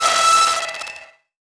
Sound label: Transition Distortion Electronic; Production Element Title Transition